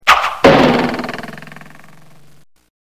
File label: arrow